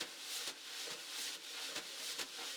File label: Drums, Jazz